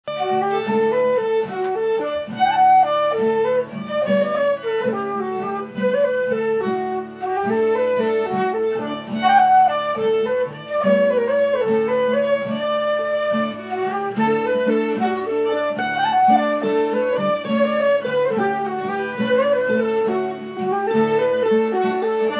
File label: Jig; St Lawrence Jig